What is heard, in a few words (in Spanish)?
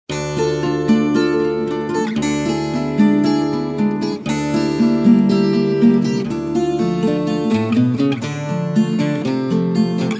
viola e acordeon pampeana arrabalera